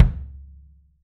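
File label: wood kick, right; 3D Drums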